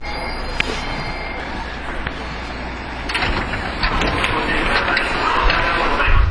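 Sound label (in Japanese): ドア開け, 207系, ドア開けの際はドアチャイムがならない; 阪和線の快速置き換え目的で登場。車内は０番台をベースとし、; 阪和線の実情にあった、2+1の座席配置（補助席なし）となっている。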